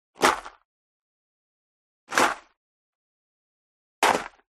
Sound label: FOLEY STEP High Heels Gravel Light Jump; smartsound